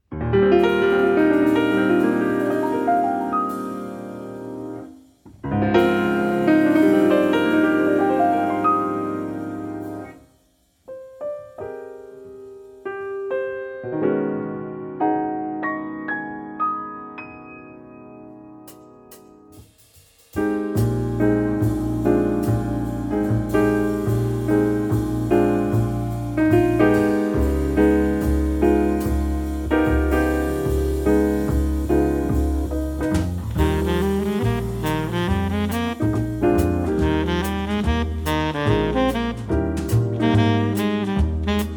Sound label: Jazz Orchestra